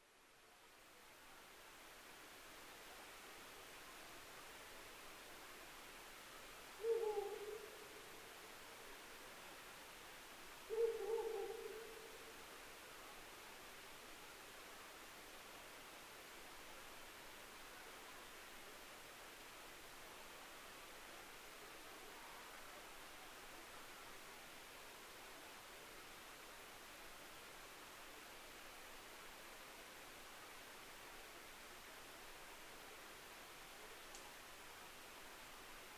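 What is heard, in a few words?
Ural owl; fukurou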